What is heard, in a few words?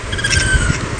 dive sound